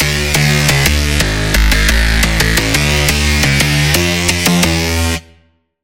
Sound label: Lead, Sylenth1; Heist; Routed Sound; Sylenth1 Expansion; Chaos Bringer Vol.1